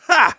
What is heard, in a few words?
laugh short; Scout